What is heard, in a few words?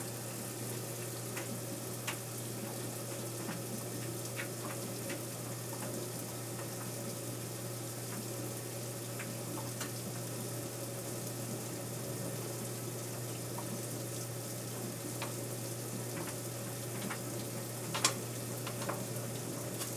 Washer Fills Dryer Runs; Fairlight Sound Lib